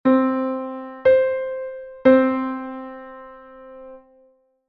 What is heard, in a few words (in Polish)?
oktawa c1 c2; konsonans i dysonans